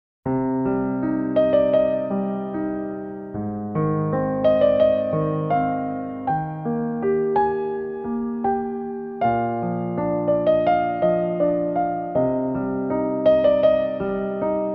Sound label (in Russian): Печальная фортепианная музыка